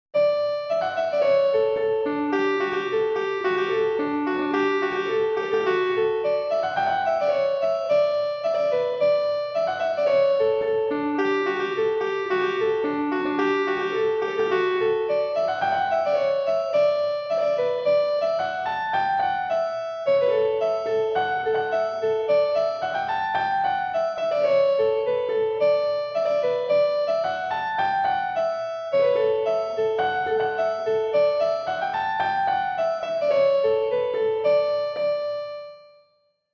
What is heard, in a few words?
midi slowed